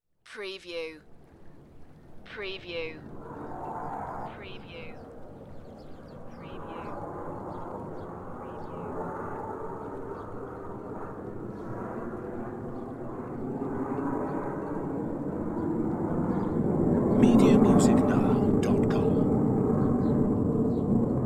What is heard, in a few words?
Jet fly by at Borth Estuary; beanstalk audio